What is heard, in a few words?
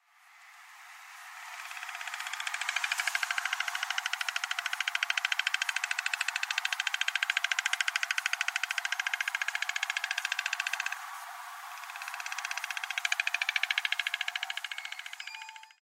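Oriental Stork; koe; toyooka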